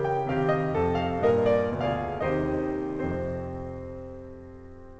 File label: piano